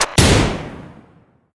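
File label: double action revolver; Weapons; RimWorld Biotech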